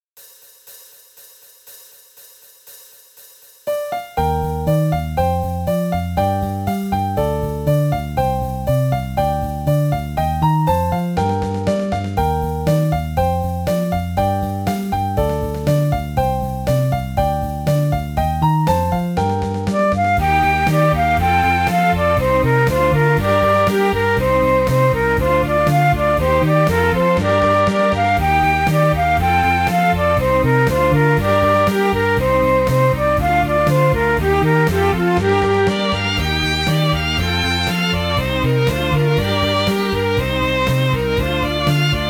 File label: instrumental music